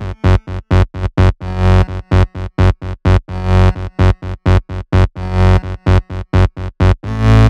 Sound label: Melody 128 BPM Root F#; Vengeance Samples; Melody Kits